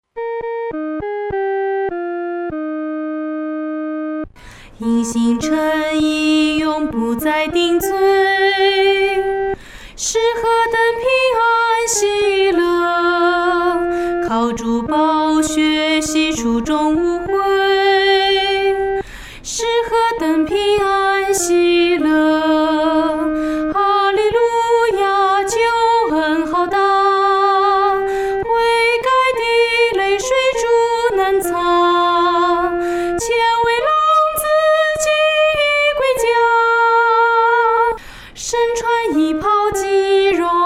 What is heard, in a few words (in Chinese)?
独唱 第一声